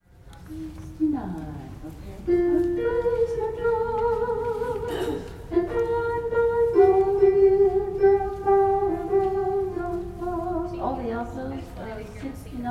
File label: altos